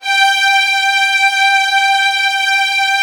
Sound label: LDFV G5, LOUD VIOLINS; Q Up Arts; Denny Jaeger Master Violin Library